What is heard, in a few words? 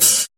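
Closed Hi-Hat, Dance Drums; Best Service ProSamples vol.15; AKAI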